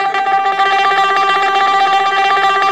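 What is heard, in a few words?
EL BZ TREM G, bouzouki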